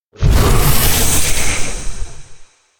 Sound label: elec bigserpent dragon roar; anemoi